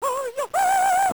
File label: opera